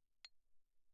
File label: xylophone rosewood pp C8; Percussion